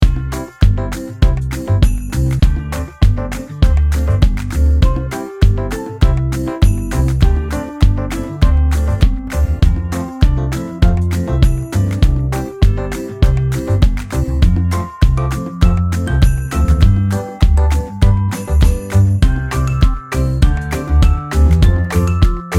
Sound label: game music; Santa Run